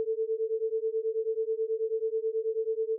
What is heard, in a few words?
Autogenerated sine 120 cents